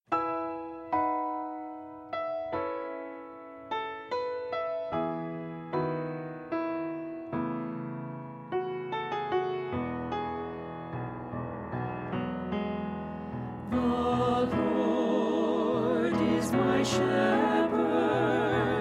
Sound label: Tenor; Psalm-23-Basler